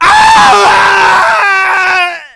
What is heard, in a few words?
Painfull; RPG Maker; Wartools v1.14